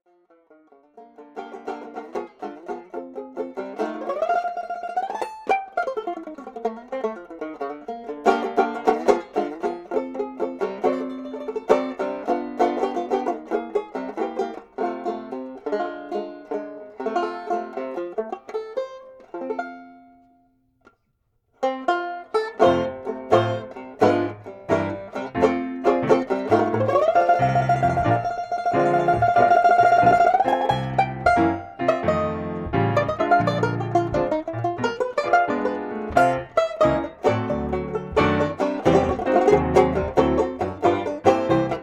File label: Banjo, Blues